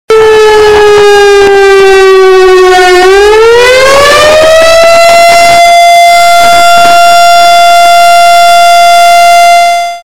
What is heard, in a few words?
siren